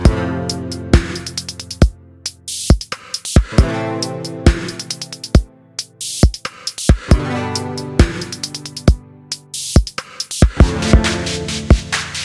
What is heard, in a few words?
jukebox song; server-nalc